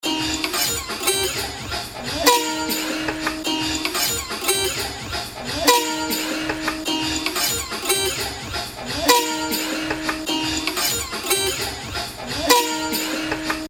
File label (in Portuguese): Loop Cavaco